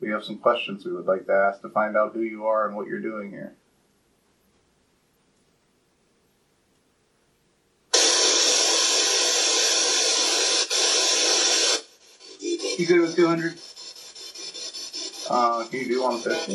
Room 428 Spirit Box

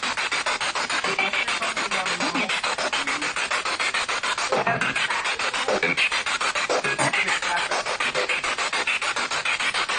Living Room Spirit Box; private residence freedom road apartment investigation

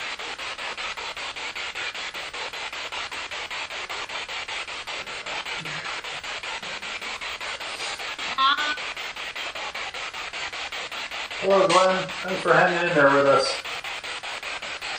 Physician Room Spirit Box; fairfield county infirmary investigation

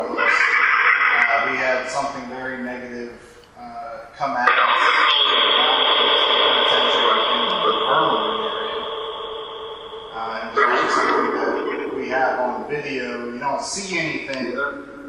Dungeon Orphanage Phasma; fairfield county infirmary investigation; 4th Fl

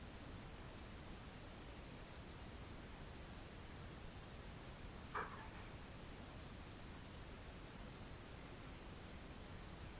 Grey Indian Head Room Static; wexford general store antiques investigation

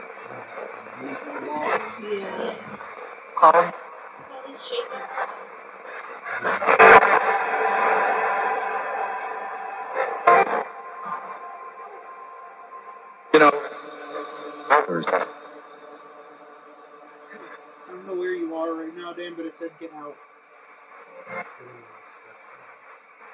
Library Spirit Box; wexford general store antique shop return investigation

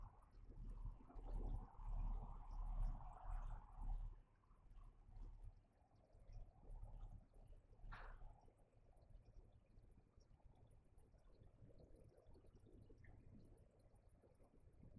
Master Bedroom EVP Quiet Time; Irish-Townsend House – Investigation

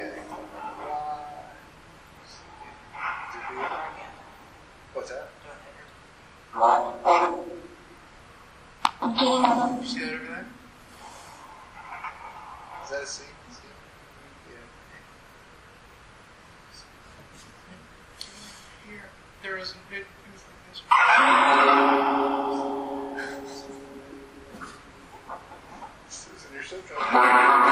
Auditorium Phasma Box; beaver area high school investigation

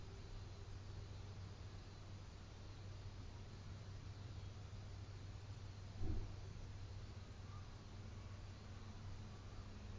Static Empty House Located on Main Staircase

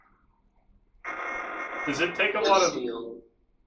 Basement Open Room Phasma Box

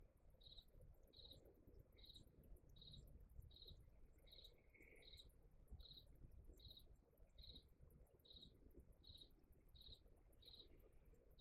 3rd Floor Hallway EVP

